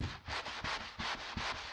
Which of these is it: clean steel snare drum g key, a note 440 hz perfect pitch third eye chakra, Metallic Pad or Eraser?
Eraser